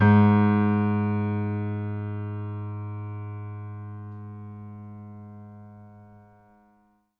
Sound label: MIX L, Acoustic Piano; InVision Interactive; Miroslav Vitous